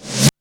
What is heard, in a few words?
SNARE REV; Back In Time Records - Big Bang